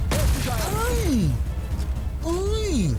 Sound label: aiiiiiiiiiiiiinnnnnnn